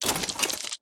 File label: armor